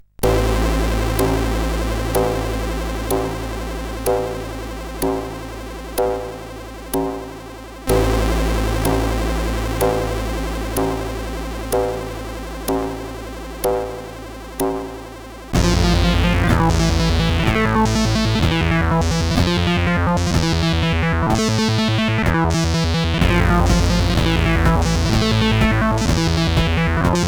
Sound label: Church Bells, CSG8580R5